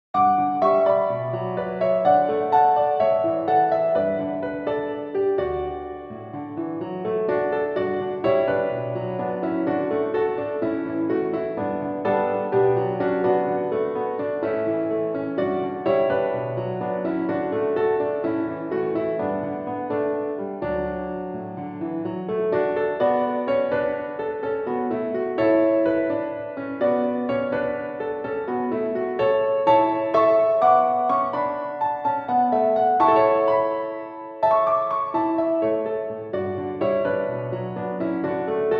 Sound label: 2 Part Accompaniment Track; sc elementary honor choir